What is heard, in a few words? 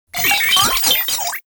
Sniper Drone Vocal; Enemies; Warframe; Lasrian